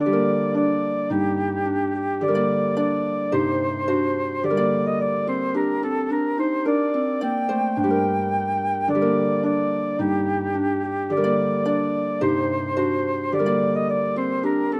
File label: for flute harp; anonymous little cuckoo